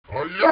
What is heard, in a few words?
"hayah"